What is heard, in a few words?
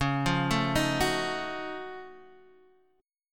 F sharp Minor Major 13th C sharp